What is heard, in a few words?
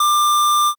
censor beep